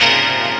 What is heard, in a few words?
CHORD, Drum & Bass, 130 BPM; Ueberschall; Drum & Bass Flash Trackz